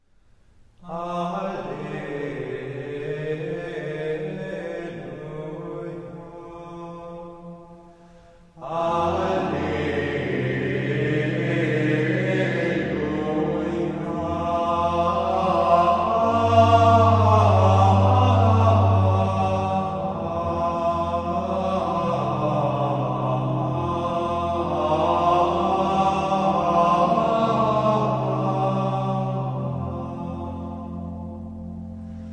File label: Alleluia SOLO; Sagrada Familia; Vere tu es Rex; Dom post Epifania